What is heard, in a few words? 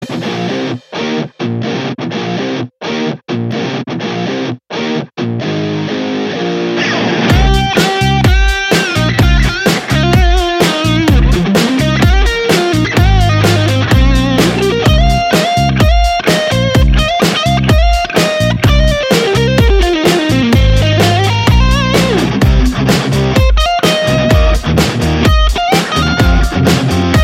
Guitar BGM